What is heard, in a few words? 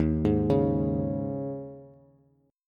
D sharp 7th Suspended 4th, Bass; D#7sus4 arpeggiated; JGuitar